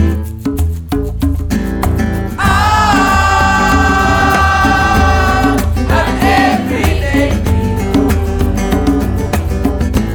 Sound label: Chorus